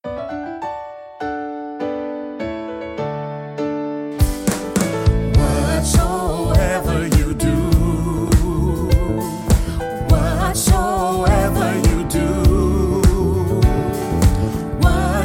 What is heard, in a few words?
Whatsoever You Do, Gospel Vocalises